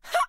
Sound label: move down